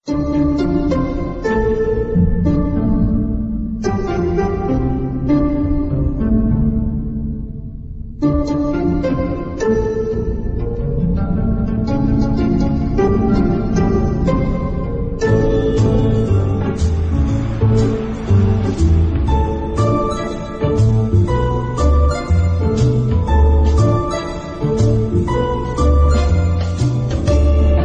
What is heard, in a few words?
chinese background music tone ringtone; galaxy ringtones